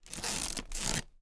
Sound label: Wicker Basket Crush